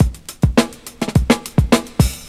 looner soiface, Drum loops Boombap

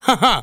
ha ha

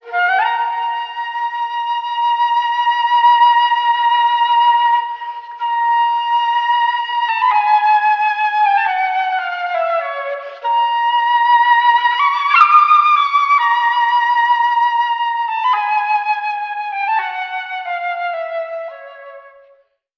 flute, Loops, Sad

